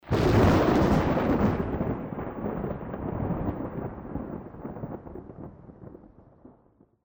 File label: Thunder loud; zps; Ambient